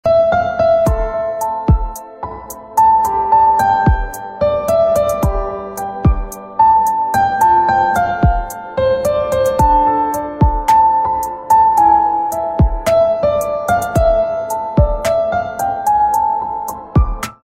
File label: Piano Instrumental Ringtone